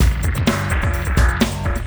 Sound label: CB LOOP; Zero-G; Chemical Beats